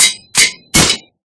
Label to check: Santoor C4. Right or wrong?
Wrong